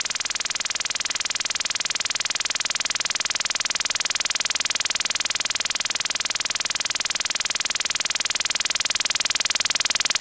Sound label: Bradwell HF surface wave radar, UK BASED EXPERIMENTAL RADAR